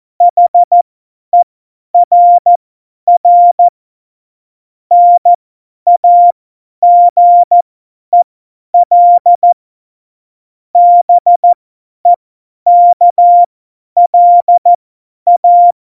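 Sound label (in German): Witz, Morsekurs, cw morsen; Witze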